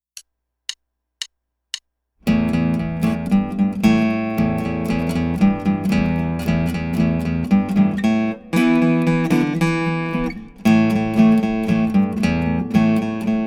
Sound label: Driving Bass